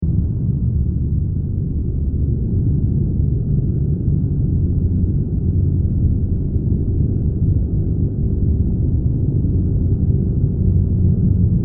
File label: Deep And Slow Ambience 120bpm; Scary Soundscape Sound Effects; Scary Soundscapes (Sound Effects:Horror)